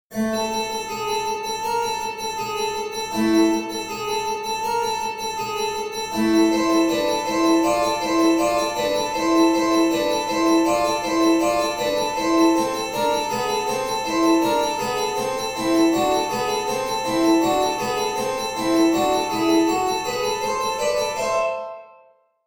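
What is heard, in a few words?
harpsichord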